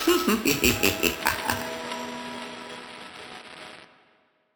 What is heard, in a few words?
Retry Jingle; Friday Night Funkin Mario's Madness; Golden Land